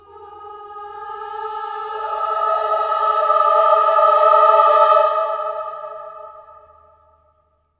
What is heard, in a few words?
CLUSTER, Voices